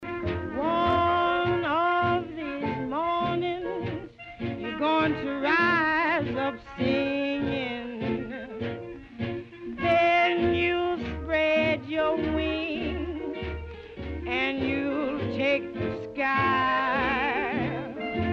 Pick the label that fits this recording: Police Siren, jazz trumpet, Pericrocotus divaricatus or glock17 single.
jazz trumpet